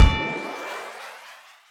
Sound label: bell blast; HeavenStudio; launch Party